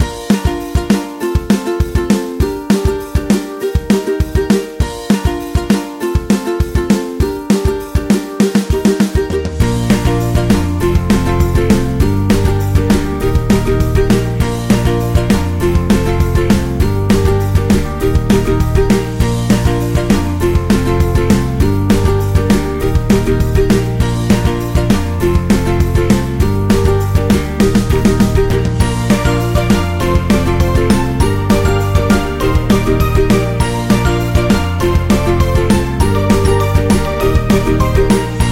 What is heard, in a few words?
Happy Upbeat Loop